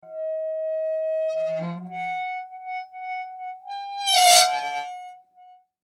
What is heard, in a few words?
Impact Metal Squeak Long Slow; Materials